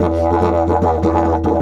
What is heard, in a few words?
E 150, DIDGE RIFFS